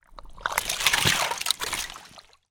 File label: foley footstep foot lift out in frozen water deep ice shards muddy; zapsplat